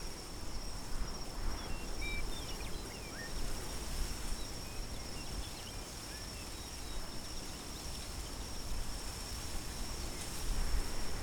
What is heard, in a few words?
amb teatime meadows